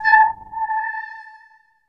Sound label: syn a4, Synth Choir, EMU E-III; Retro Sampler